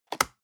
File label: plastic box food small open hard